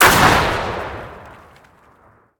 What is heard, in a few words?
Bomb; MiSide